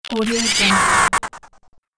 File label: interesting glitch